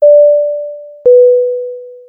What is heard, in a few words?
airplane seat belt